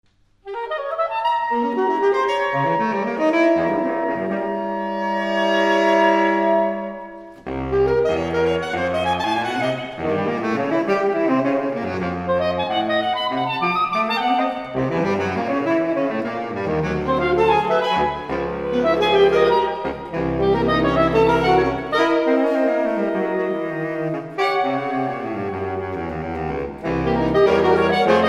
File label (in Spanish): Jazz Suite Funky, saxofones